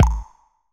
Percussion, House Drum One-Shots

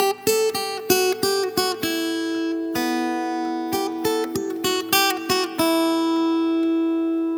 Guitar 130 BPM (E Minor), Acoustic Guitar; Chamber Of Secrets

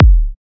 Kick A, Psy Kick; Drums; Ghosthack; Ghosthack Advent Calendar 2017 Day 9 - Psy Kicks; Ghosthack 20 Free Psy Kick

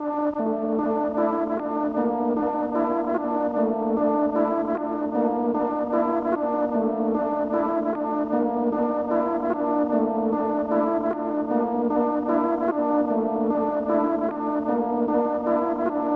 151 BPM D Min Main Pad; Loop Stems; Cymatics; 2022 Melody Collection Platinum Expansion; Mutant

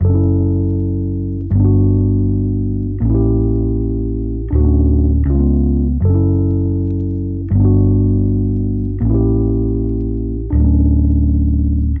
E Piano 80 BPM D Maj; Melodics; Cymatics; Eternity Sample Pack

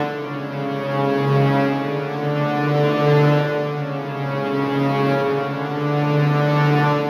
Pad Loop C# 135, Techno; Ghosthack; AC2024 - Day 1 - Techno Sounds 2025; Pads

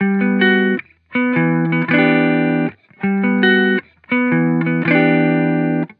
Hip Hop Guitar Loop - 160 BPM G Maj; Cymatics; Vibrations Guitar Sample Pack

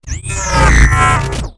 wooshes transform sweetener; Whooshes

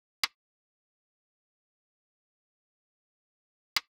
Drum Loop 136 BPM Rim; Loop Stems; Cymatics; Vibes II Drum Loop Collection; Zenith

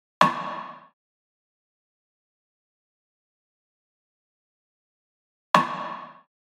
Trap 90 Rim; Drum Construction Kits; Loops; Ghosthack; Drum Mega Bundle Demo; Drum Hero 5; Trap 1

